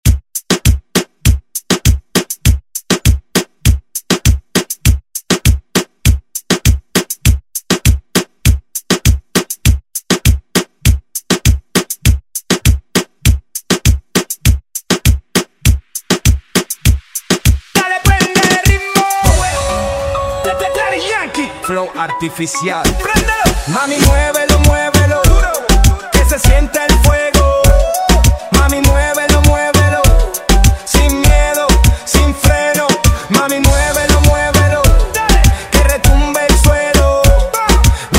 Reggaeton Redrum

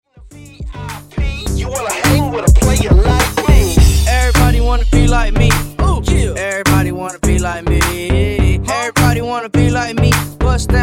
Funk Wordplay Transition 104 110Bpm

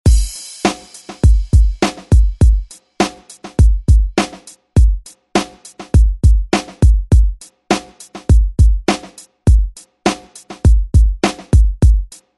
90s ReDrum; DJ Mhark

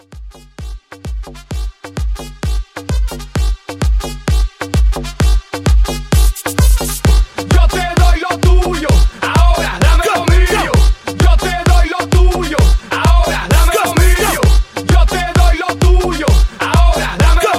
Hype, 130

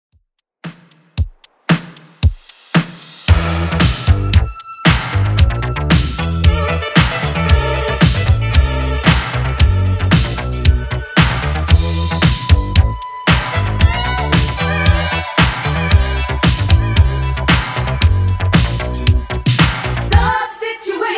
80s Redrum 114bpm

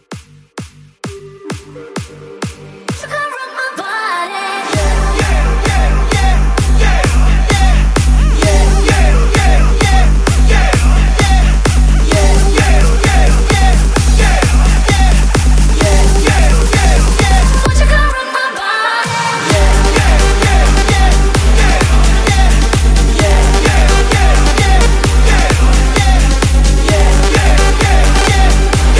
VIP Hype Edit 130

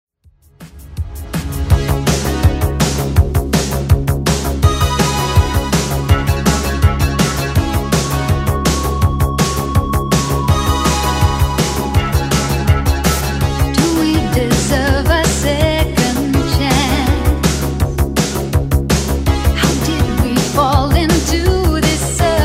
80s, 164 bpm; Redrum

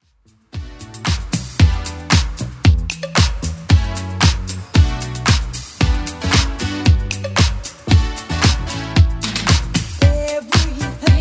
80s Club Edit 114bpm

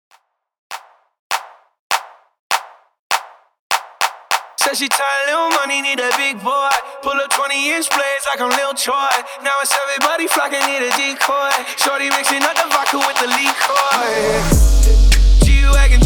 Clap Intro

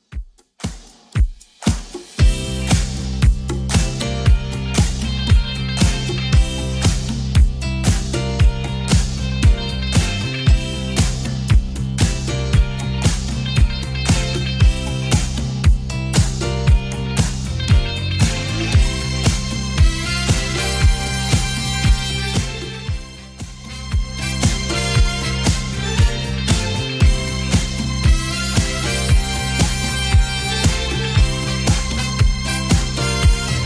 80s Redrum 116bpm; remixplanet

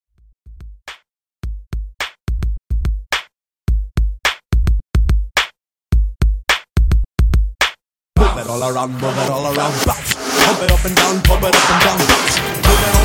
90s Hip Hop, 107 Bpm

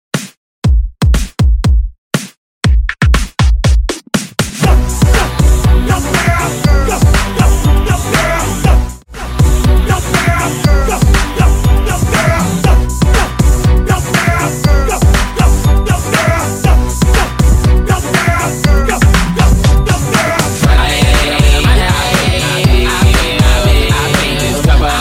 Re Drum Hype

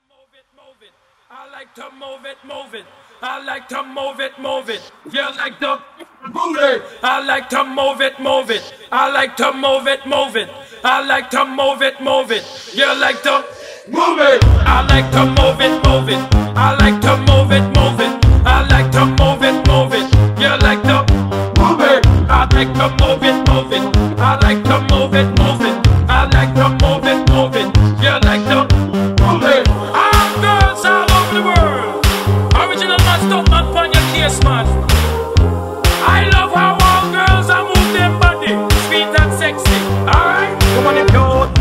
Acapella Intro Mash Up

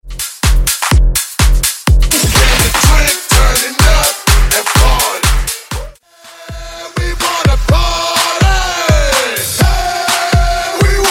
Extended Redrum

